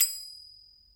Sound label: EL ZIL, METAL PER; AKAI S6000 CD-ROM - Volume 1; PERCUSSION